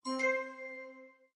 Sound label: answer correct; yumpod